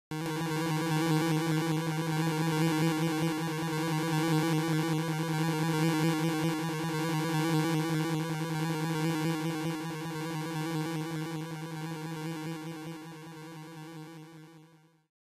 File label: Boss Warning